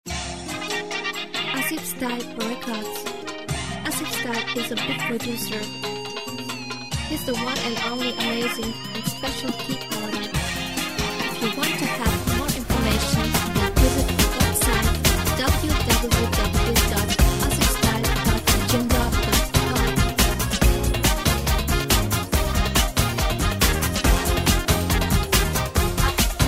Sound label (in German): Balkan Feeling mit werbung